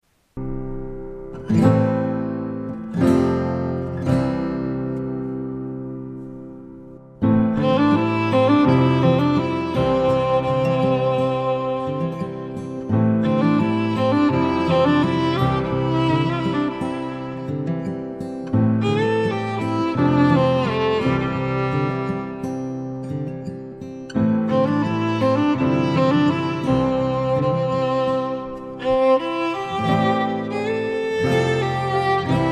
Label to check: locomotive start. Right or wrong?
Wrong